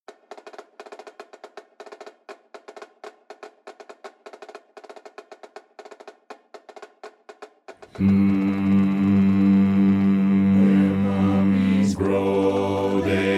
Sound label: Bass